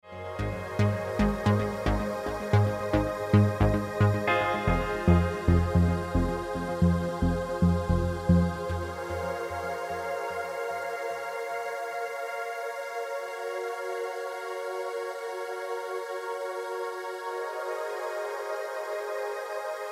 Remix Ringtone

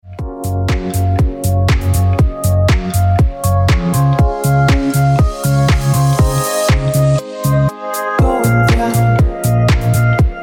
Original Mix Ringtone

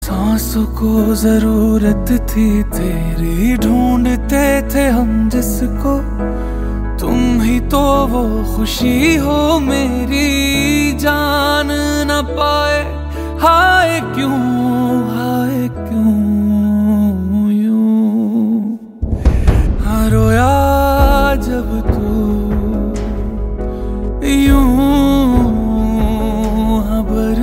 Song Ringtone

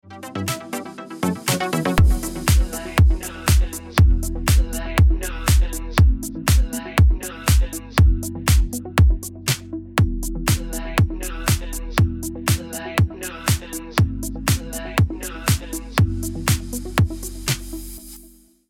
Remix Ringtone

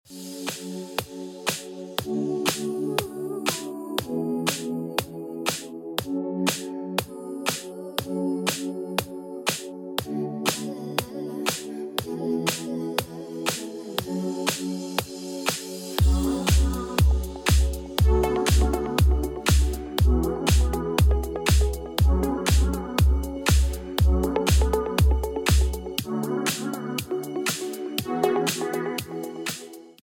Ringtone

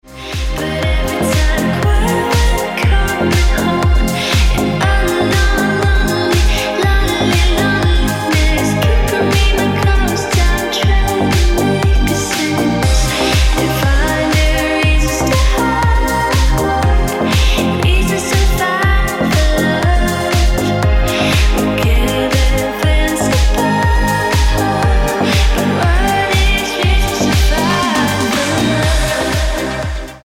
Ringtone

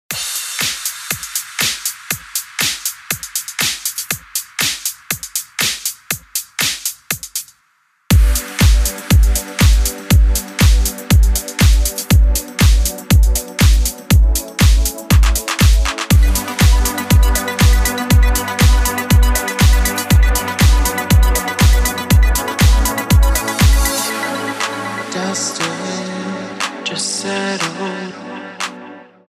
Original Mix Ringtone

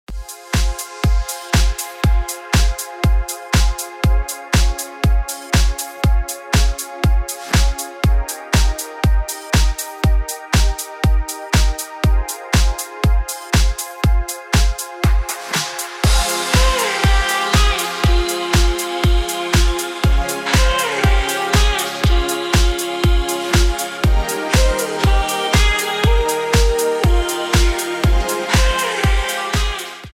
Ringtone

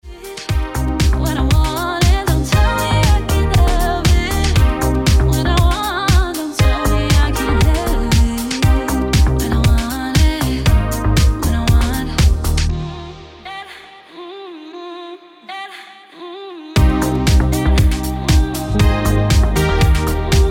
Ringtone

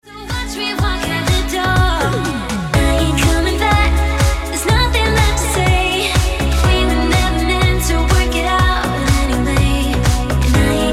Ringtone

